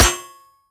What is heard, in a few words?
metal, bullet impacts; physics; Half Life Model Pack